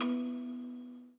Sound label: musicbox